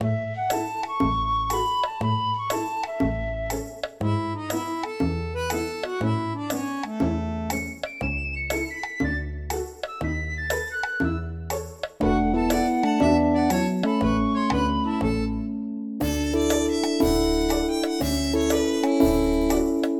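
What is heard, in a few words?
neighborhood; AA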